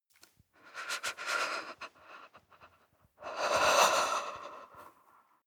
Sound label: breath female shiver